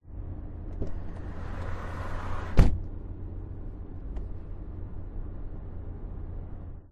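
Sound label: car door open close while driving interior pov; zapsplat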